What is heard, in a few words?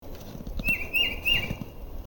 bird chirp